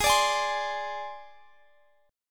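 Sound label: C Suspended 2nd Sharp 5th, A flat, guitar chord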